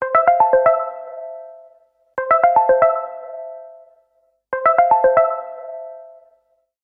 nokia lumia ring

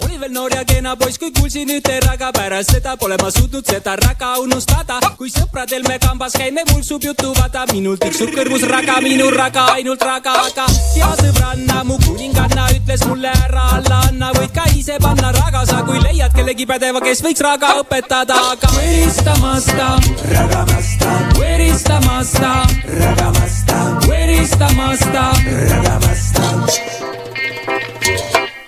raggamasta

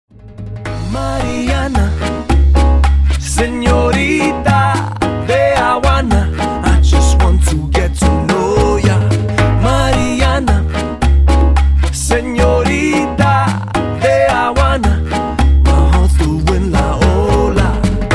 bossa nova remix

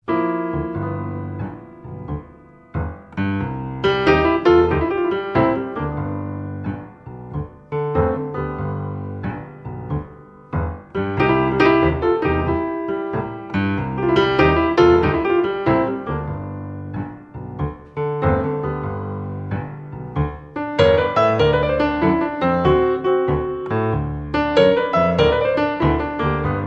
blues

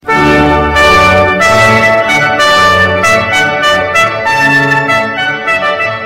hornpipe; from handel

